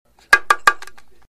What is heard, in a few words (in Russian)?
bongo trojnoj stuk